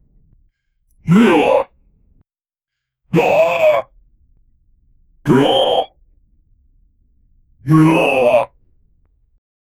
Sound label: enemy sound for fps game, humanoid